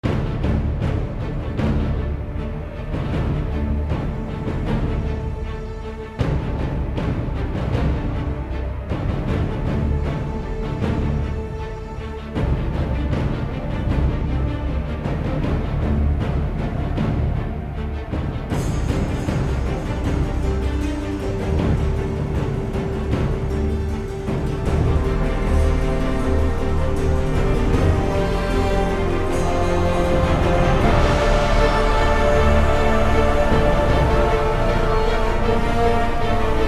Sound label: Cave Music (High Intesity); children of morta